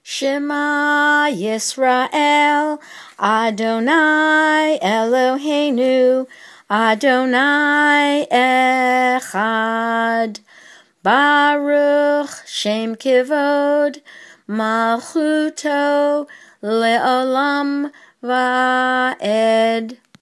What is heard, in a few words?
Shema Baruch Shem